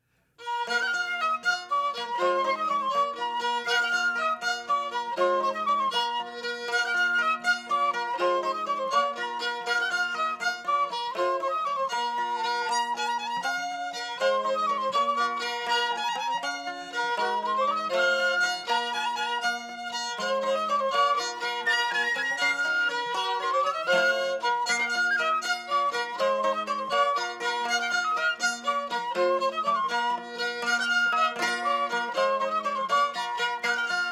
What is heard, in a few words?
Tavern; Assassin's Creed Unity